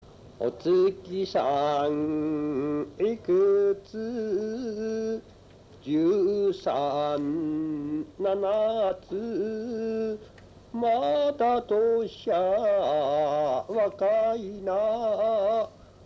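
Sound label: lullaby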